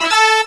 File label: SUPER SHEEP RELEASE; Worms Armageddon